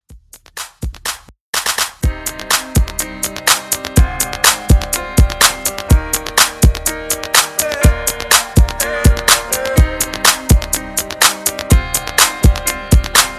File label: Dance Up 124 BPM